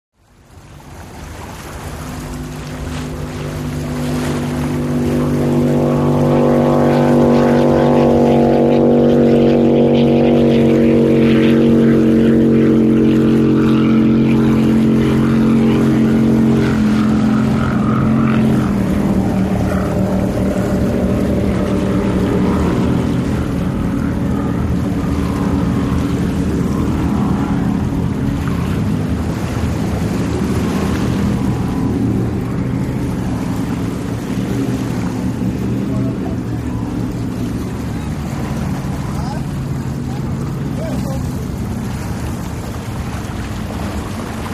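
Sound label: Power Boat Race Ambience, off shore power boat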